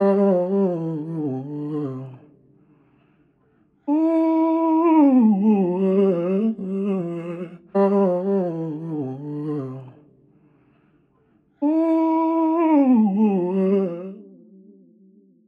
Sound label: Vocal Loop - 124 BPM A Min; Simple; Cymatics; ANALOG EVOLUTION Beta